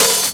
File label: soft open hat c key